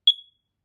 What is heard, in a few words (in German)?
Stoersignal, rauchmelder; GLORIA-R-10